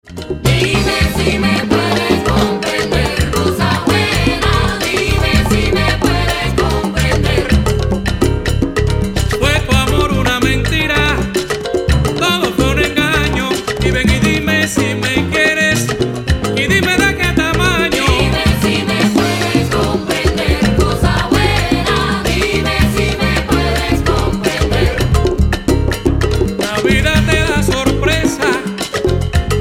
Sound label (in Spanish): Rumba